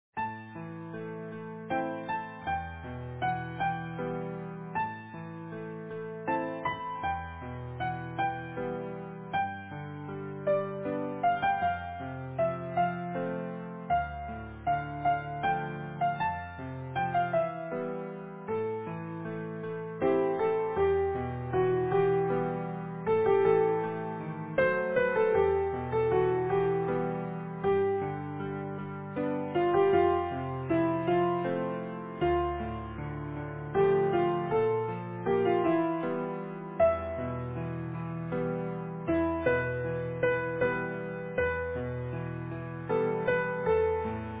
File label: Easy Piano